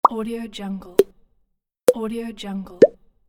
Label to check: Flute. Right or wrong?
Wrong